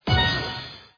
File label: UI Explosion; football; Brazil